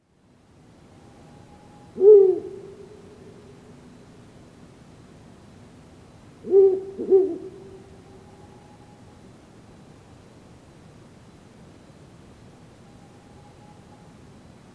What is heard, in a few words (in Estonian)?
handkakk; winter